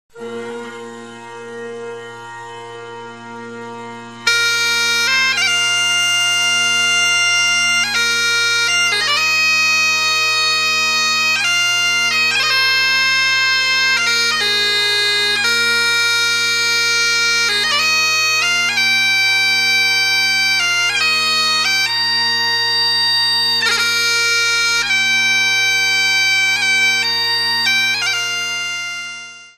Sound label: Kilted Music; HIGHLANDER